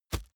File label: plastic tense bag soft heavy